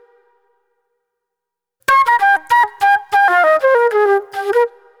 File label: NY 70's Jazz Flute